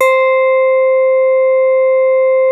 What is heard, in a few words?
HARD C5, synth pluck; SYNTH-PLUCK2